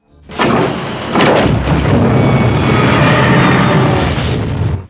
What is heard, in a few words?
torpedo fire